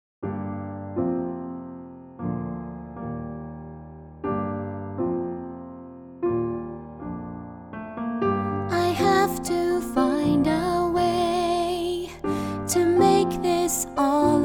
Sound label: Piano; My Little Pony Friendship is Magic; Season 3